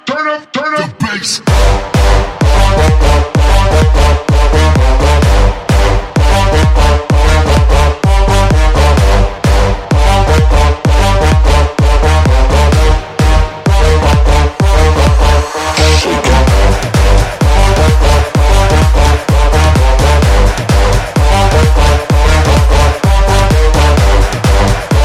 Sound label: Bassjump